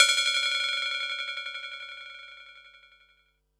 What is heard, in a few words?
Perc, Percussion and FX; Pearl SC-40